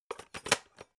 electric whisk insert whisk heavy; haus-garten; kueche

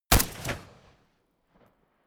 rifle ak-47 exterior fire single close; comedy-cartoon; Pistols and guns; pistolen-gewehre

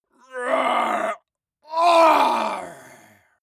male groan painful double; menschen; schreie

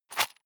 sundries shake hard; berufe; handwerker; soundpool

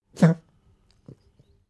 pug gag smack medium; natur-tiere; hunde

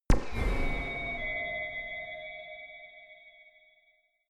ringing laser beam shot; soundpool

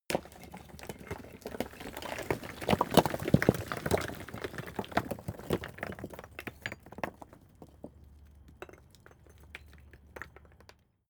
stone large fall long; natur-tiere; steine

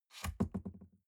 cardboard box open unfold bounce; objekte

